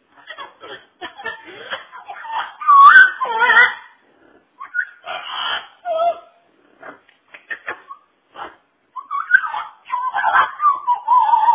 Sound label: parrot talking; Marta Lorena